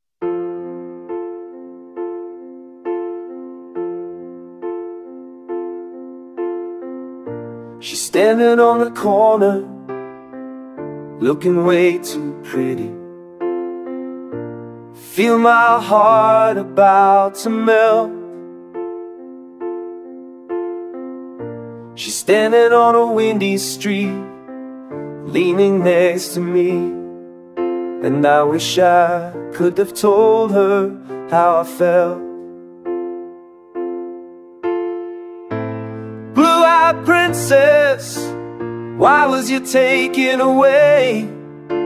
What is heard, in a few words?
piano mix